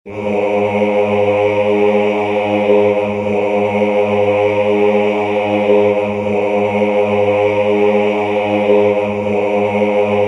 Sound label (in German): Im Fruehling Bass